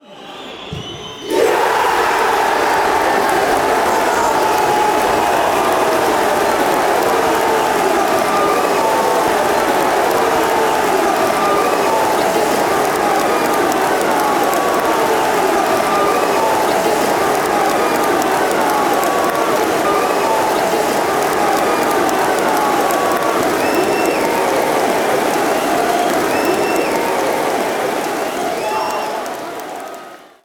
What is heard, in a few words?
goal, fanchants